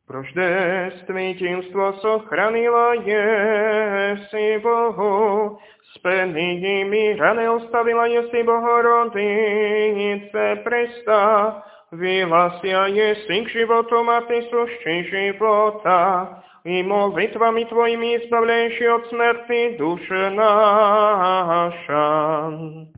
Tropár Uspénija hlas 1; nedelna-skola

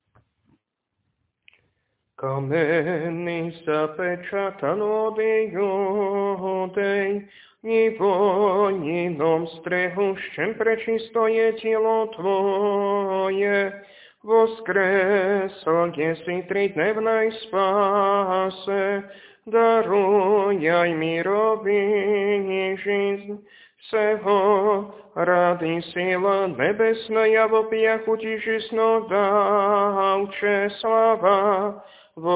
Tropár voskresný hlas 1; nedelna-skola

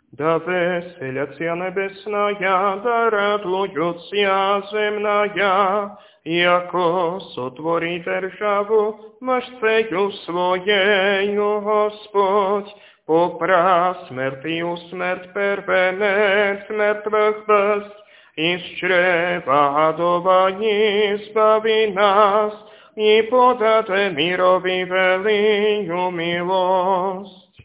Tropár voskresný, hlas 3; nedelna-skola

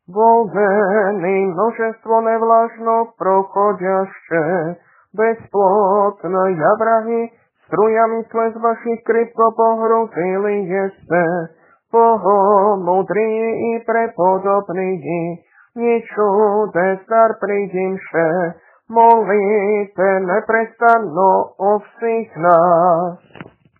Kondák hlas; Kondák prepodobným, hlas 2; nedelna-skola

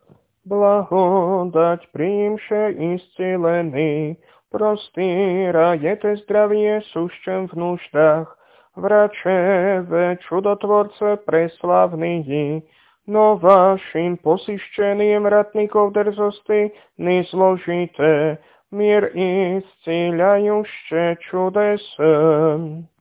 Kondák hlas; Kondák nezištníkom a divotvorcom, hlas 2; nedelna-skola

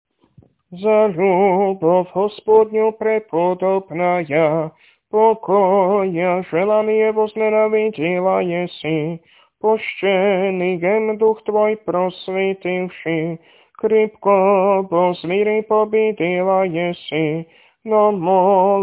Kondák prepodobnej, hlas 2; nedelna-skola

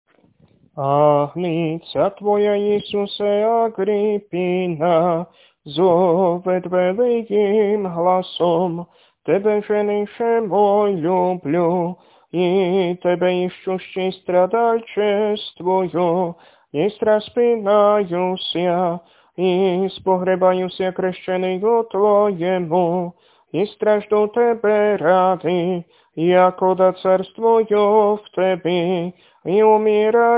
Tropár mučenici, hlas 4; nedelna-skola

